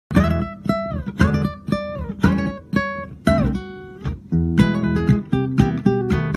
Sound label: acoustic intro; spongebob; vine